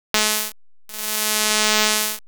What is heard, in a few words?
volume enveloping